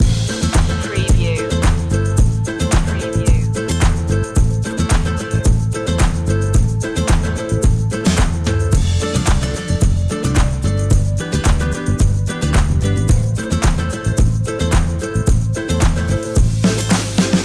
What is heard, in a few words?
latin stomp loop